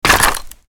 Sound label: wall wood destroy